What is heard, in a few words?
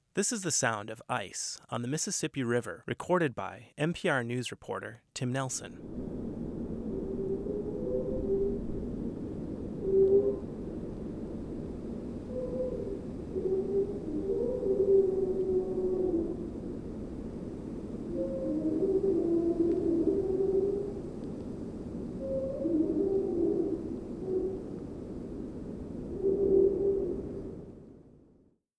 Morning Sound